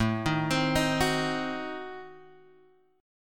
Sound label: A Diminished 7th A down Guitar Open F; Adim7 arpeggiated; JGuitar